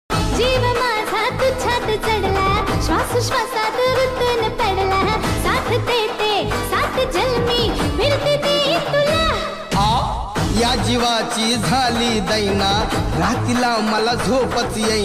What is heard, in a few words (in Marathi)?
marathi song dance dj; pune